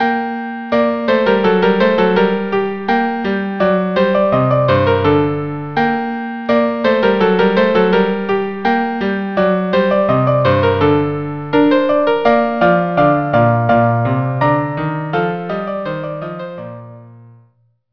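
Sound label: violin cello; Amadigi shepherds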